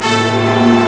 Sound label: hi orch; ST-XX Amiga Soundtracker Sample Packs